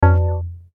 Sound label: BUTTON SOFT; Multimedia; Blastwave